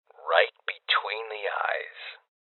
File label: Sniper Shot; Rebel Cops